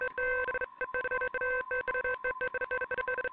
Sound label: morse code, filtered morsecode like